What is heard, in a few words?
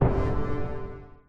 Rebloom Drum; Musician; The Undergarden; XBLA Xbox 360 gamerip 2010